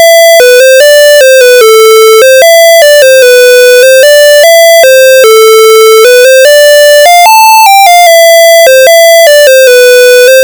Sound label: tetris raw square; Python